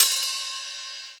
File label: HH, HIHAT HIP, Dance Mega Drums; Best Service